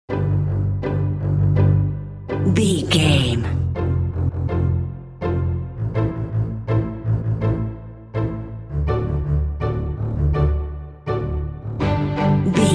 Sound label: happy proud pop strings